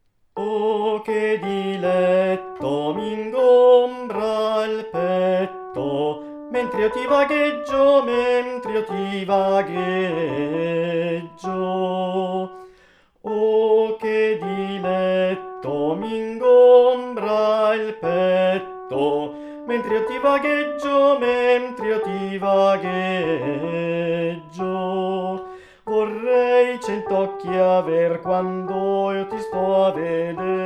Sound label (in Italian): Soprani; LINVAGHITO